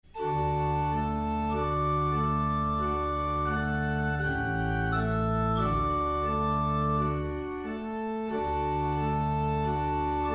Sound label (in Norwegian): Klarinettkonsert; Mozart